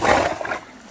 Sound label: grey goat stand